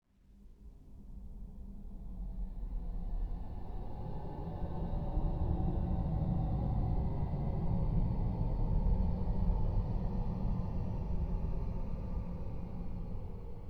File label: horror; Minecraft; liminal-industries; Liminal Resources